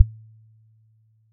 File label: sinc 110hz